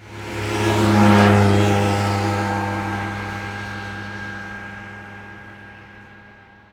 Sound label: car drives fast, street traffic; best service Blue Box